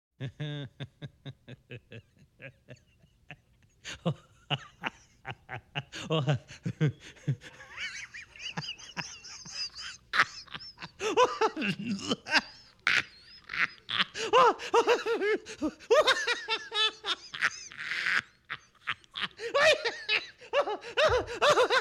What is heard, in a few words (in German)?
gelächter; Geraeusche; Lachen